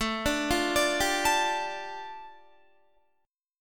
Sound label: A 7th Suspended 4th Sharp 5th, A, down, Guitar; A7sus4#5 arpeggiated